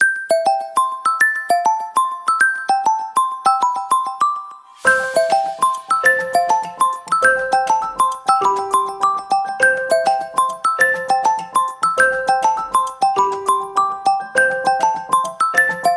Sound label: Samsung Galaxy J2 Pro, dzwonki na telefon